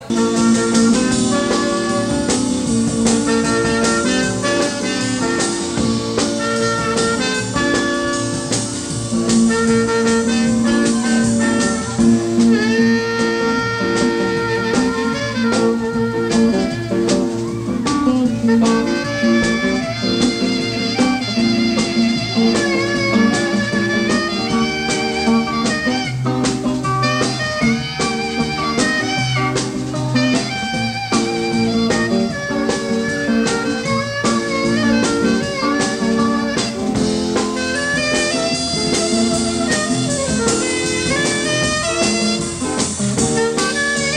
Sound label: sax solo